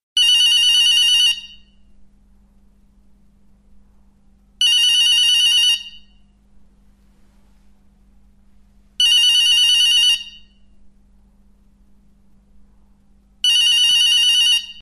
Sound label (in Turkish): ince zil sesi